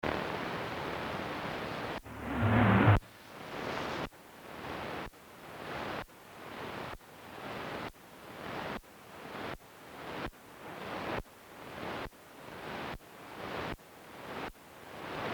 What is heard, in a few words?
5kHz steps no noise, radio